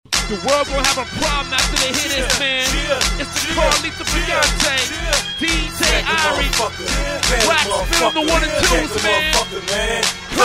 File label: CLAP